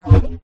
buzz low fat descend flutter slight whistle left descend ascend negative pop; popup error; Skyclad Sound